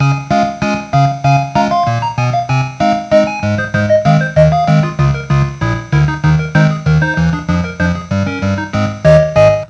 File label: Game end music